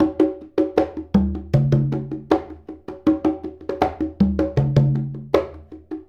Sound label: Congas; Essential Percussion